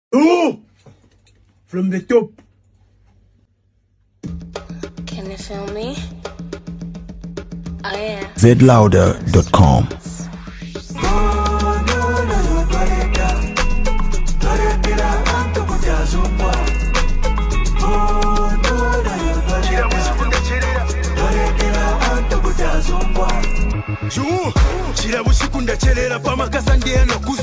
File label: Drums